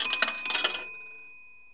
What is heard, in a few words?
payoff bell